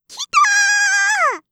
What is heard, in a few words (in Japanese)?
キターーーー, 客席